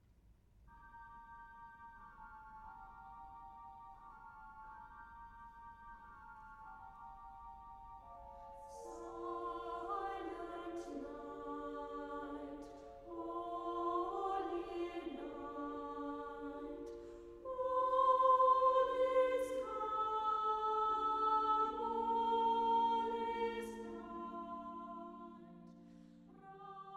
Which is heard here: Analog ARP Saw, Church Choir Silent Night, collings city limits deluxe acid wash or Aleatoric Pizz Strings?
Church Choir Silent Night